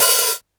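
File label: Hi-hat open, Yamaha DD-6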